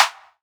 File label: snare snap; Sonic Foundry (Sony Creative Software); Ugly Remnants VOL-2; Beatstuff